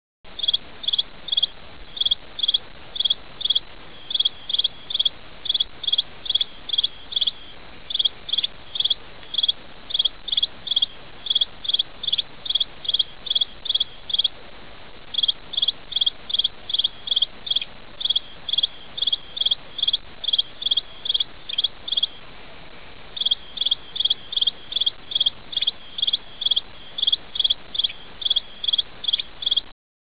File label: cricket